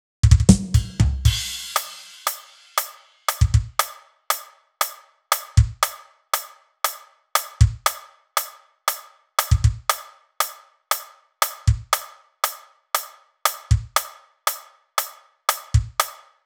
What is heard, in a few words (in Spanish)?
BATERIA; Bateria nortena